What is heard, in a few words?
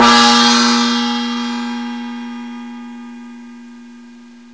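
gong single metallic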